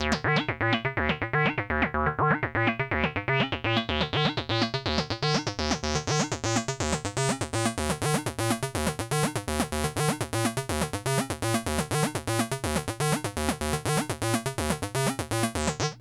RAVE 123, SYNTH SEQ; AMG; Global Trance Mission VOL-2

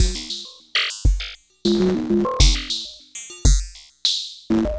Ratchet Beat; Sonic Foundry (Sony Creative Software); Ma Ja Le Ethereal Textures

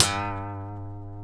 A, funk guitars; Spectrasonics; Hans Zimmer Guitars VOL-1; TAKA FUNK

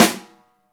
SNR, Snare; Club-50 - Foundations Roland; Ext.Snare 1

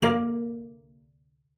A#3, CELLO FINGER; Xsample VOL-5

